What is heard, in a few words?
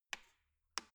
embedded button press slow